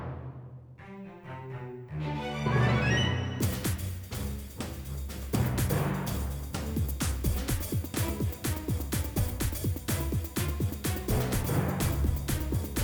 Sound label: Boss Hi Part; DreadWood Quest2 Squash Boss B